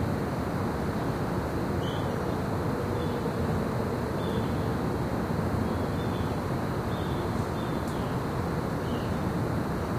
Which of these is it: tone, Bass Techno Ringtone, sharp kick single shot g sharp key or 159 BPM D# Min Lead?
tone